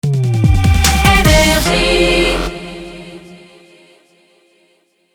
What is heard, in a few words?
Jingle Rapid Fire; NRJ